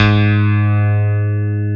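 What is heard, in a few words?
piano electric G#2, CP-70; Sound & Vision; Gigapack I CD 2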